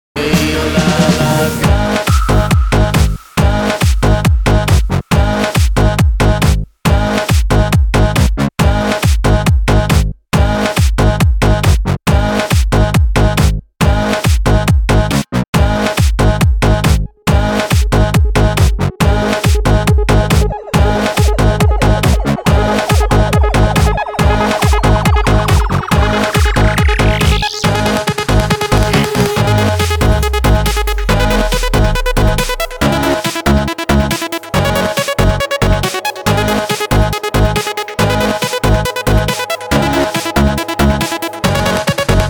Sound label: dj remix full bass